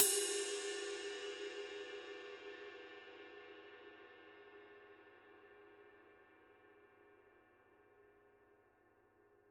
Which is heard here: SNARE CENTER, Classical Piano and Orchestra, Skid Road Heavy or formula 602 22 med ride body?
formula 602 22 med ride body